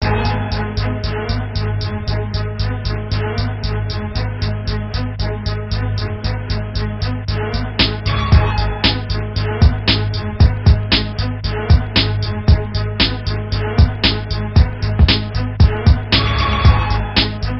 beat

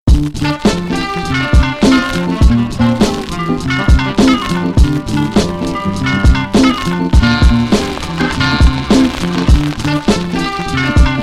beat

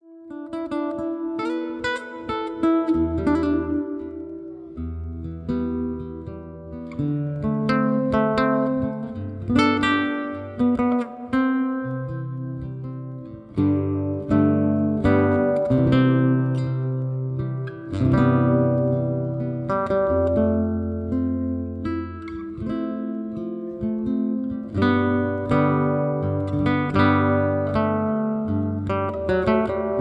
Waltz, Guitar Music

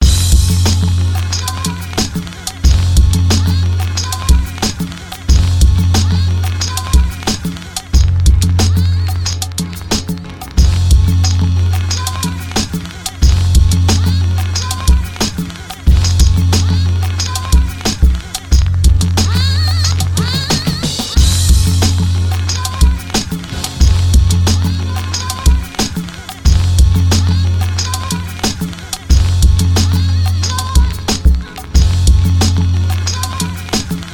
beat

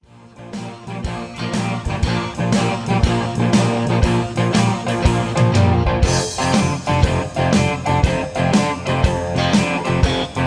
I-V-VI-IV Texas Boogie G 120; Peach Jam Vol 1 Part 2 Texas Boogie

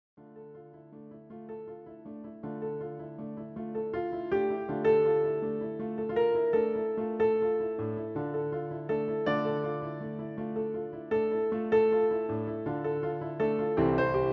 piano transcription; Gretchen am Spinnrade; Liszt

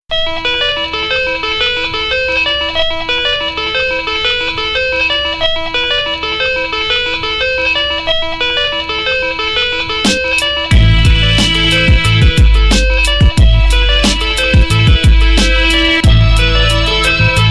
beat

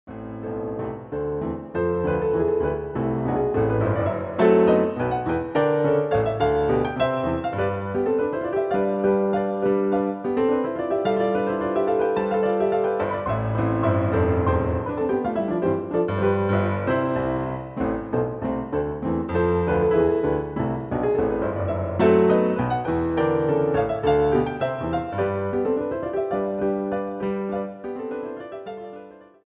Fifth Gavotte Op 162 Piano Roll; Played by Chaminade

